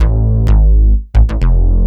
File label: BAS, 2 Step